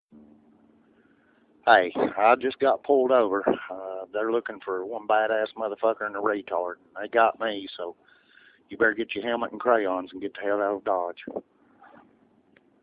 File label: Funny Ringtones